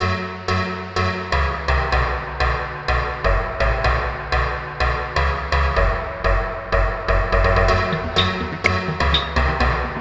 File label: rave beat; lil rave type beat; lil rave type beat my ex and I collabed on